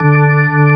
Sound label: ORG VK, B-3 ORGANS Vinta Keys B3